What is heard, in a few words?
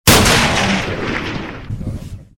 sniper shoot